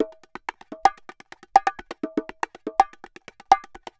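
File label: BONGO SALSA, salsa drums and percussion; Best Service ProSamples vol.14; AKAI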